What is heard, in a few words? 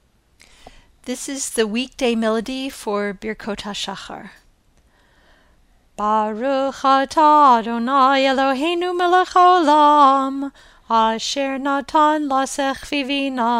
Birkot Hashahar